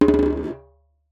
Jembe Bounce; Percussion Samples

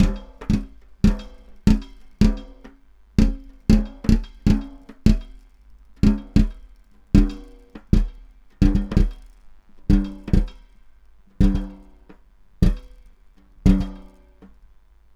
Snare Drum; Percussion Samples

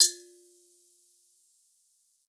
Bonang Para EQ, Gamelan

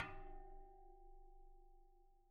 Bicycle Spoke

